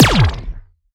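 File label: Sci weapon Shot Small Laser Zapping Pulse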